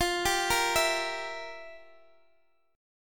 F Major 7th Suspended 2nd Suspended 4th, F, down, Guitar, DADGAD